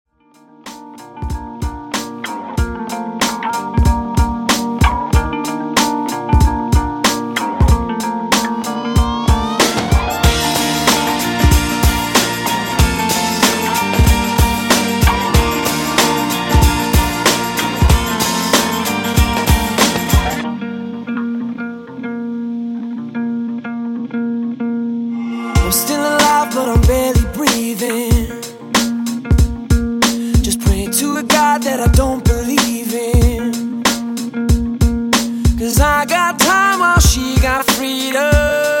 Pop Rock Redrum 94Bpm

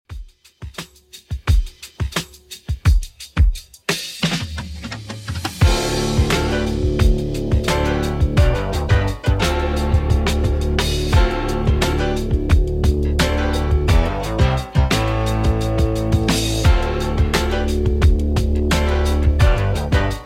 70s Redrum 87bpm

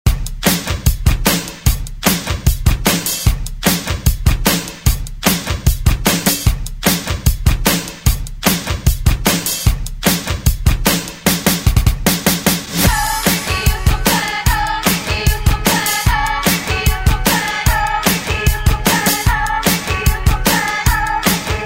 Oldschool Redrum; Deejay Hit

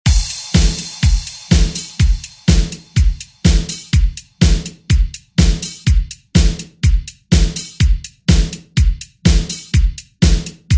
80s ReDrum; DJ Mhark

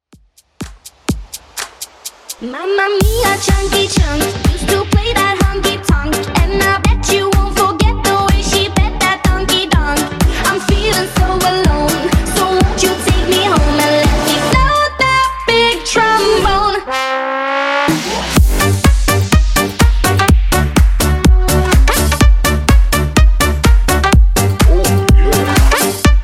Top40 Simple Redrum 125bpm

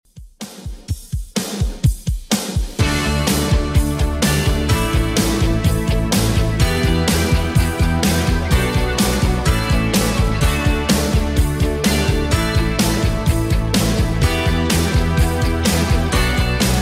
80s Redrum 126bpm

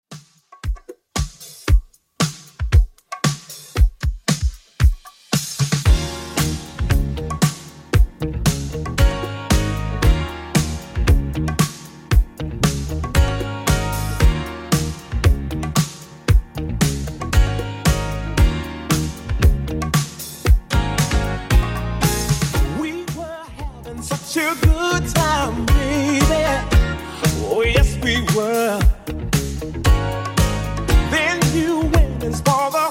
80s Redrum 115 bpm

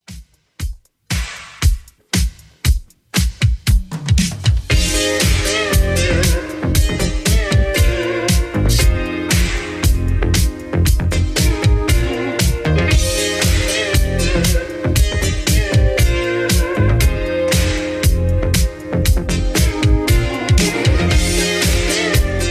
80s Redrum 117bpm

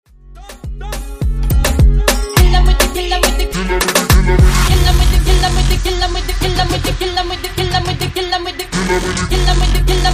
90s Moombah Clean

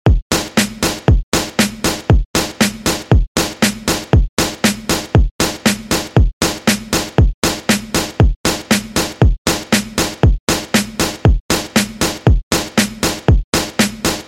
Funk Grid Redrum 118Bpm

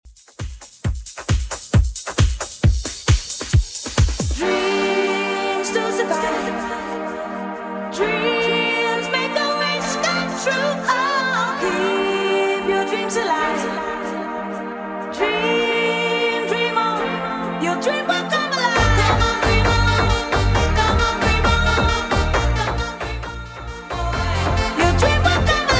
90s Redrum 134 bpm

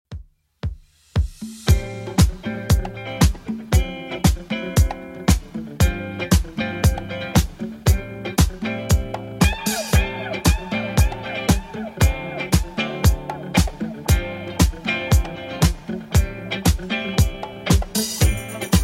80s Redrum 116 bpm CLEAN

